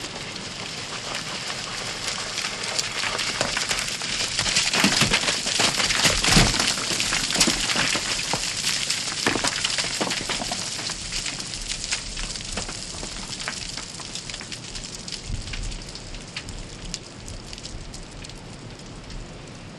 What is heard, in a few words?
Rockfall, On The Glacier